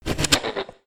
Robot Machine Transform

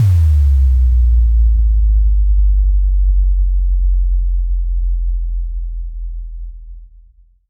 Bass Impact

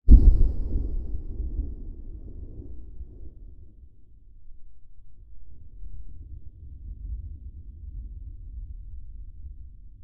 Huge Distant Explosion

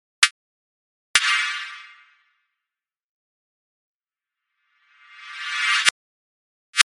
Metallic Hit With Reverb

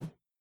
Weapon Swing

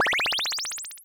Retro 1up

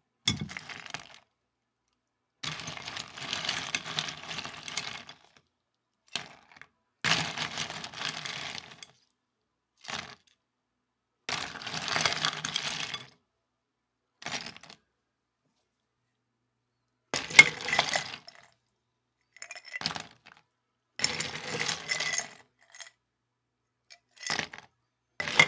Bar Scoop Shovel Ice From Bucket

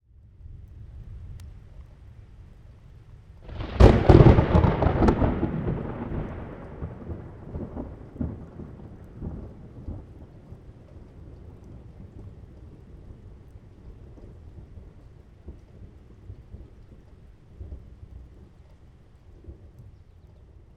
Thunder Close Crack Light Rain roof, Toronto; Mixpre; 5pm

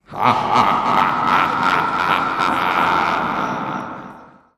Scary Laugh

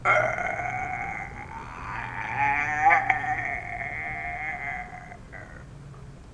Monster

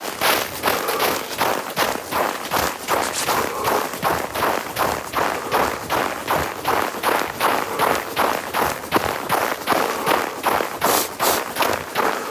Running On Snow

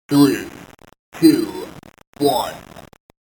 Countdown

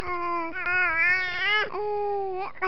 Baby

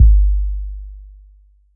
Huge Bass

